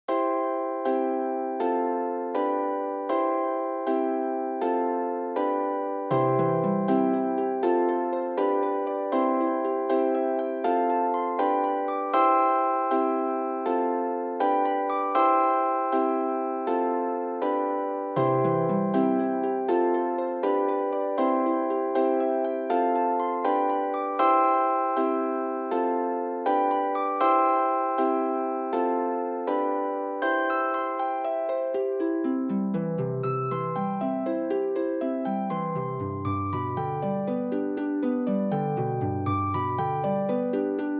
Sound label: Christmas Bells, harp